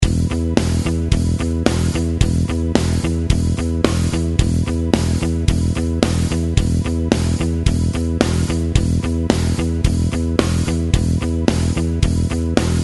jamonome e straight 110bpm

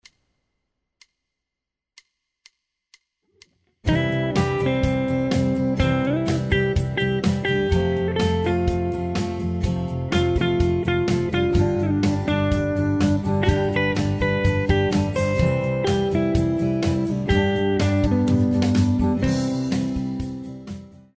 simple caged solo

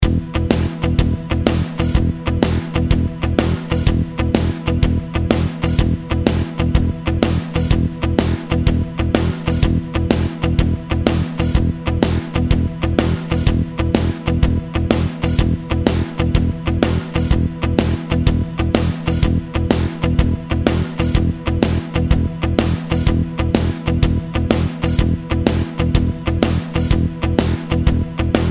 e shuffle 125bpm

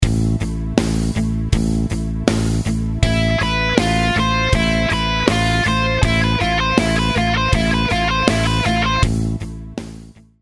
finger exercise

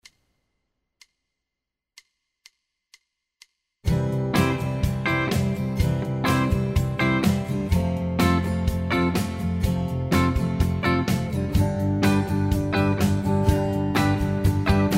simple caged chords

